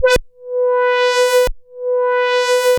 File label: Roland A B4, synth samples; Roland S100; musicradar-synth-samples